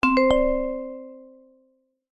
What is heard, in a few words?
multimedia alert chime short musical notification; zapsplat